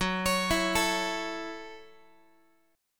Fsharp Minor 7th Fsharp, Guitar; F#m7 arpeggiated; x,14,16,14,13,x; 4A,4E,5A,5D,5G#,6C#; chord shape

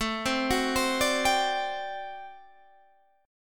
G Major 7th Suspended 2nd Suspended 4th A

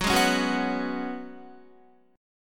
Gb Suspended 2nd Suspended 4th, Guitar; strummed

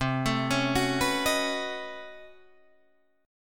B 9th C down Guitar Standard; B9/C arpeggiated; chord shape; JGuitar

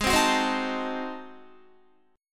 G sharp 7th Flat 9th G sharp down Guitar, chord; G#7b9; strummed

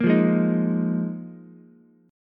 D sharp Suspended 2nd Suspended 4th, Ukulele; strummed; JGuitar

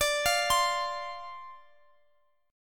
F 5th D, chord; F5/D arpeggiated

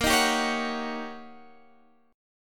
D sharp 7th Sharp 9th

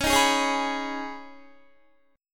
Db 7th Suspended 2nd Db, down, Guitar; Db7sus2 strummed; tuning=4E,4A,5D,5G,5C,6F

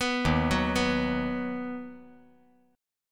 Eb Minor Sharp 5th; Ebm#5 arpeggiated; JGuitar

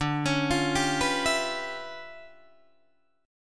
D Minor Major 13th, down, Guitar, DADGAD

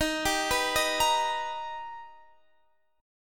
B Augmented Major 7th Eb, chord

